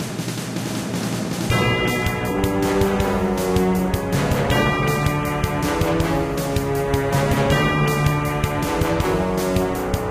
Tunnel Theme Battle; A Timely Intervention

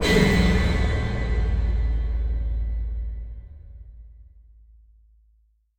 swap level; the madness of little emma

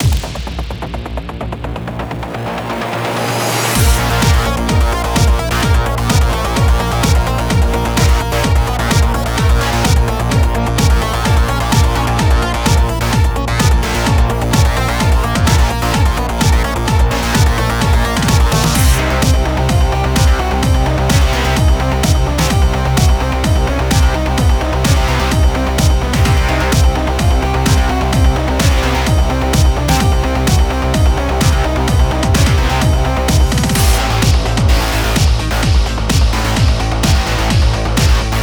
Boss Phase 2; Level 3 Boss Phase 1; Hell is Other Demons

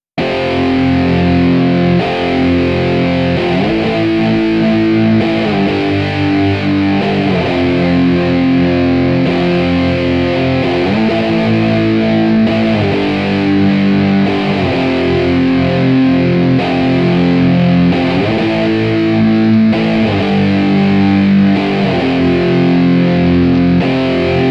Guitar Dub

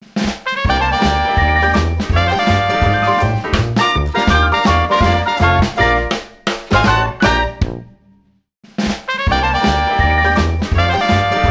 Diner Complete; the princess and the frog; Frog Music

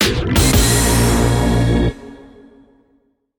Music Menu event

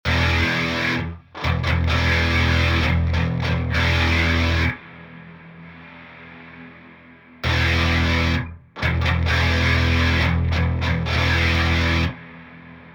Heavy Riffs; Astro Flame Starfighter; PS4 PS5 Switch Xbox One Xbox Series X/S

